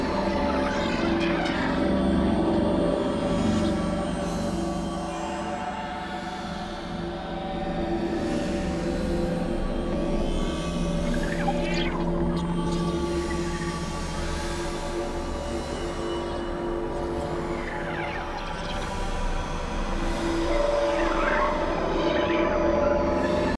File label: Landscape Interior Loop, Ambience; Corpus